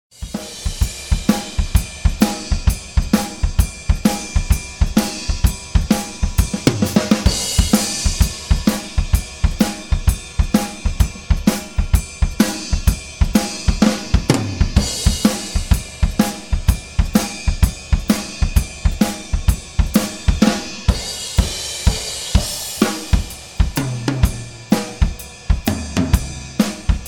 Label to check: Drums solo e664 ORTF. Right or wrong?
Right